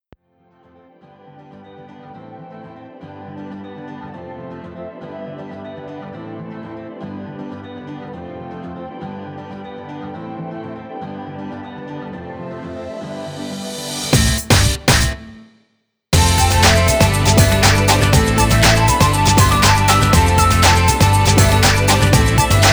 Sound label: (instrumental)